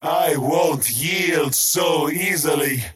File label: skywrath crown deny pain; skywrath mage